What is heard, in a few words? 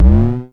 FREQ MOD, Dual Percussion Synthesiser; Klone